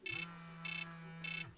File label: nokia 5510 ringtones frog